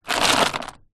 household clothes pegs wooden in plastic tub movement